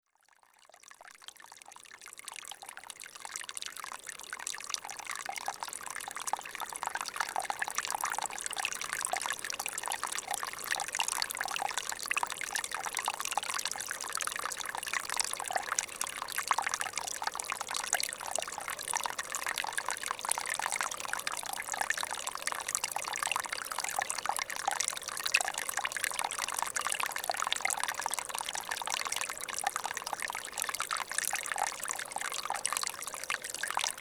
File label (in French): Ruisseau 1700m